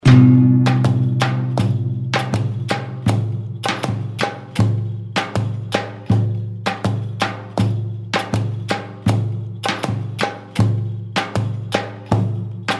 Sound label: ayub 80 bpm